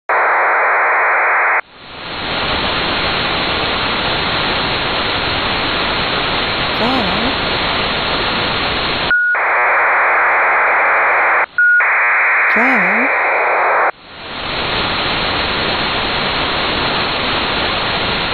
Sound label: utility HFDL 17915 kHz; san francisco